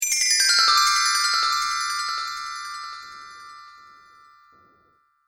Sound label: Sparkle Chimes Down Delay, chime sound effects